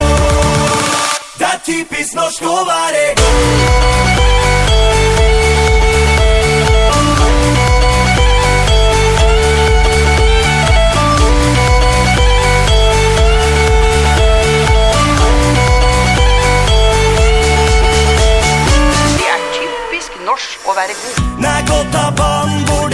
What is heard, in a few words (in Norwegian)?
typisk norsk ringtone